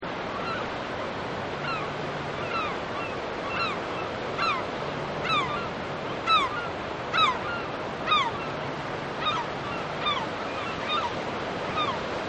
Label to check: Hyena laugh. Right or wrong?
Wrong